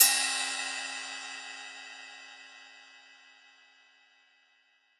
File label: Riddim Ride; Hats & Cymbals